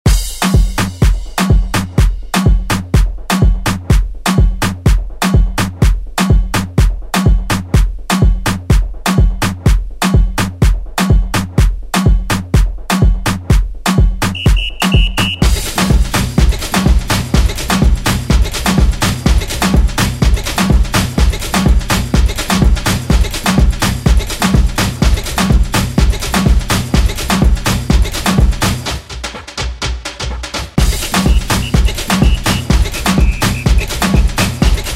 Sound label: Afro ReDrum